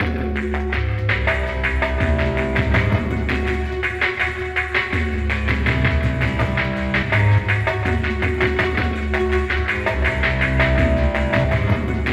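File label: psy jungle, fx loops; Zero G; Malice In Wonderland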